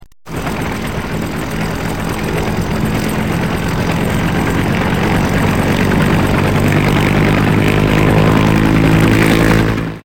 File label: Helicopter